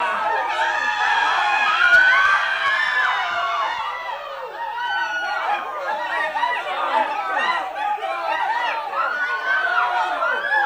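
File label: Panic Walla Screaming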